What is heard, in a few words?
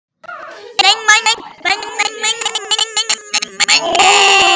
rahhhhhhhhhhhhhhhhhhhhhhhhhhhhh